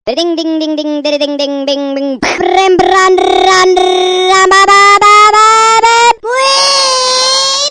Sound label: Baby Driving Car; Very Funny Ringtones